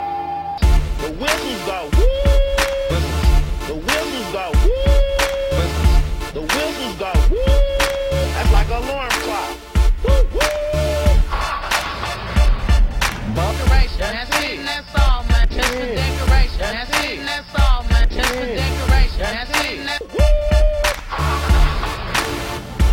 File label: whistle tips